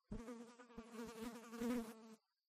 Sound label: flies; Golden Crayon Sounds